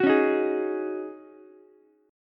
Db Suspended 2nd Suspended 4th, Ukulele, Dbsus2sus4 chord; strummed; JGuitar